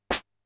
snare hard, Drum Machines, Formanta Radio Plant Polivoks